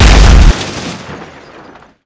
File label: Vehicle Collision